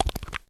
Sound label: wiggle; World Of Goo